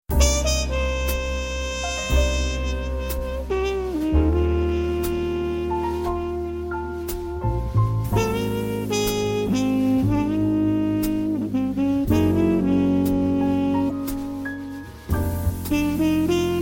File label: sweet jazz trumpet